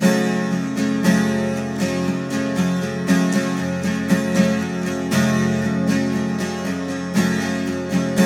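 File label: 12 string minor G, guitars; Spectrasonics; Hans Zimmer Guitars VOL-1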